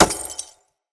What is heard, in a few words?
Bullet Impact Glass Large; Weapons